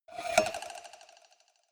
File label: cowbell scratch hit move muted